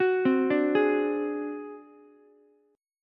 D 7th Flat 5th D down Ukulele; D7b5 arpeggiated; JGuitar

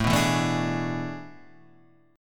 A Major 7th Suspended 2nd Suspended 4th

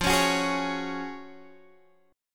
Gb Major 7th Suspended 2nd F, chord

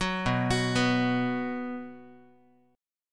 B 5th B down Guitar; B5 arpeggiated; 4A,4E,5A,5D,5G#,6C#; chord shape; 9,7,9,9,x,x; JGuitar

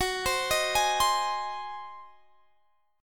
Ab 7th Gb

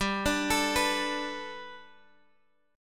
G Major Add 9th G down Guitar Open G

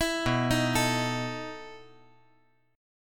B 11th

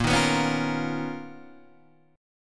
B Major Sharp 11th Bb

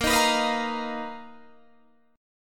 B Major 7th Flat 5th A sharp, chord shape

